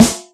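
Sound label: snare, Boss DR-202; Drum Machines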